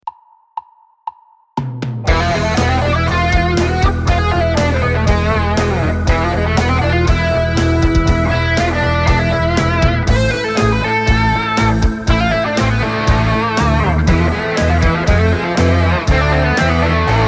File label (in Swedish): Harmoniskt moll